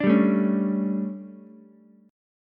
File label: D Major 7th Suspended 4th, Ukulele; DM7sus4; strummed; JGuitar